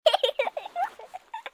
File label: kids Laughing and Giggles